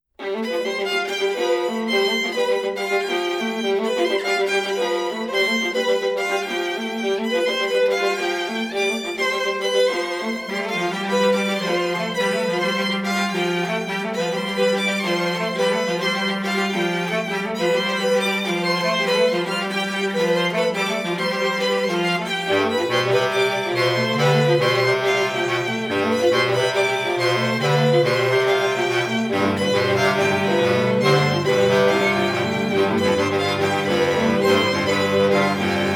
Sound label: bass clarinet and string quartet